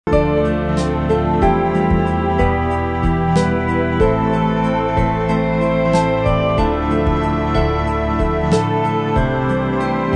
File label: a soulful melody played on string instruments